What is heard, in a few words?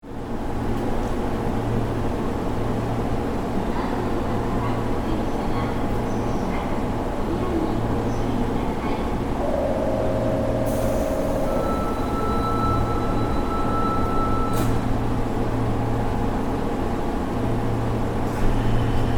subway departure